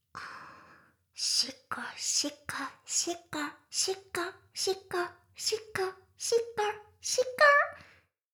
シコシコ音声; 魔法少女マスカリマッスル

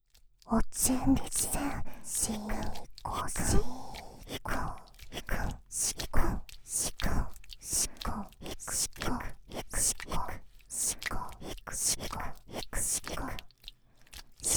SEあり ひたすら射精我慢しながら手コキループ; メスイキループ

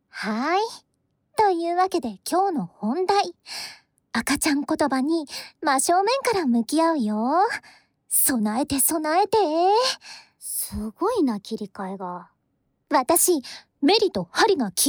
哺乳瓶＆子守唄ASMR

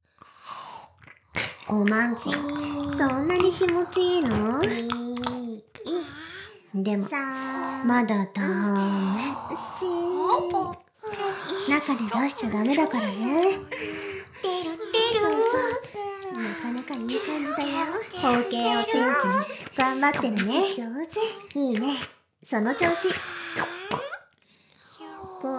耳舐め＋カウントダウン＋Hなセリフ Mix; 魔法少女マスカリマッスル

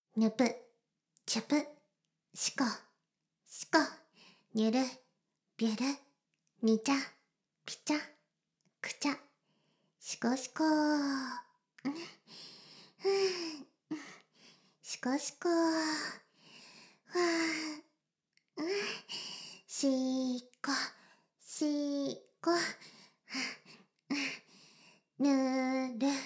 シコシコ音声; 魔法少女マスカリマッスル

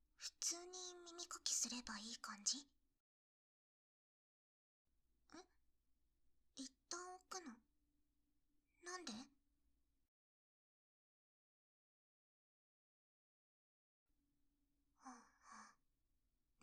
右耳かき

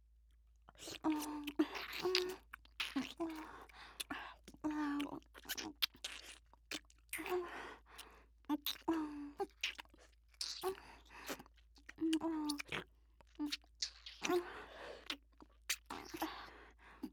ディープキス; 僕とお姉さんの音声; ループ音声集; お姉さんと僕のディープキス

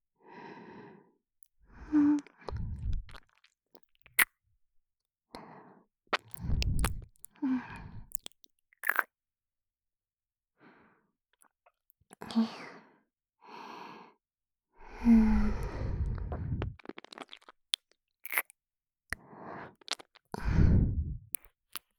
ちゅっ…ちゅっ…♪（耳キス）, ASMR, 左耳

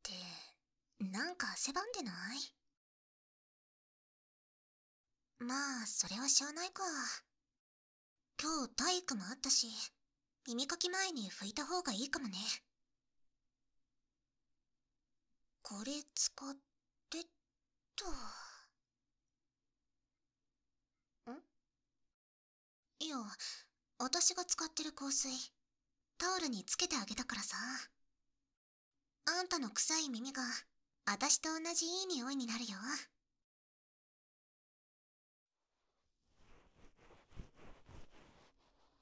右耳かき

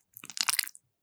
くちゅ音, ASMR

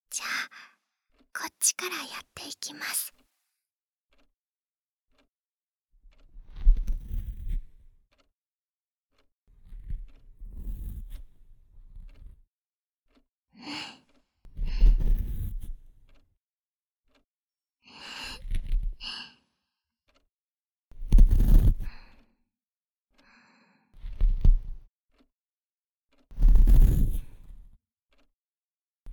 耳かき、そして梵天; かなえの耳かき

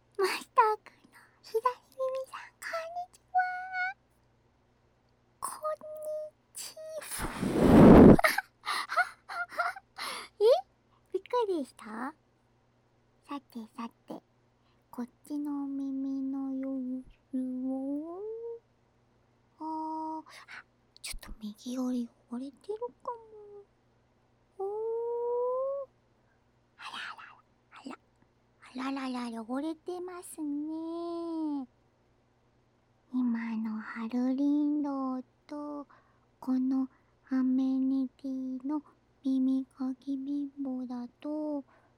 綿棒耳かき 左耳; 綿棒耳かきで、突発チャレンジはじめての耳掃除（左耳）

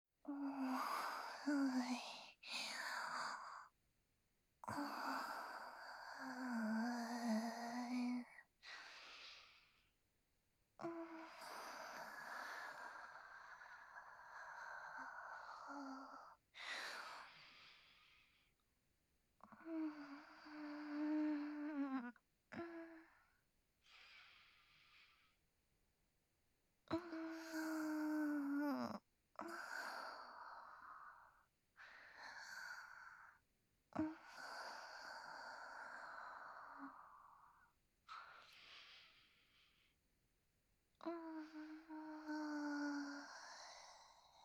寝息（センター）鼻息

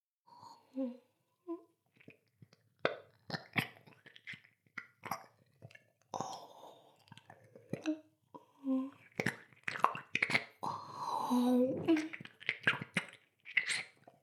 ダウナー風俗 耳舐め

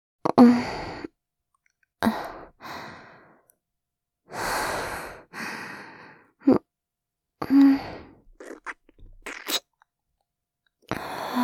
攻めキス

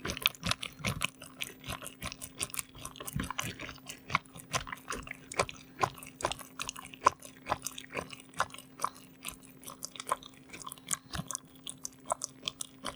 クチュクチュ早め; ASMR

